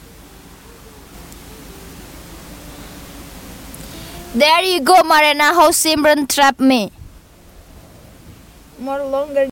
scream

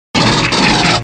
gun shot; predator

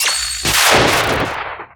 thunder spell

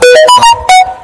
fast samsung notification

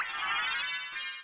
level up; sotn

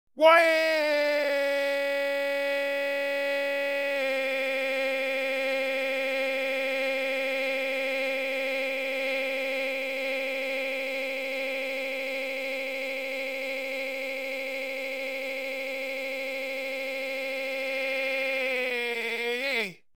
crying; vyond

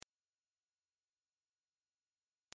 buzz

